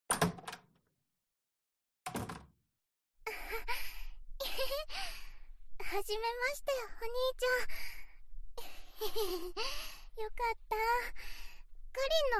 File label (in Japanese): 耳かき